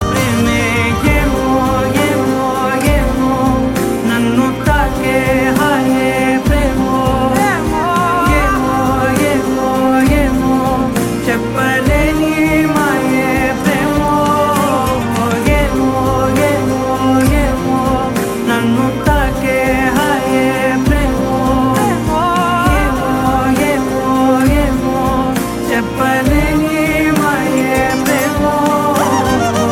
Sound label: Ringtone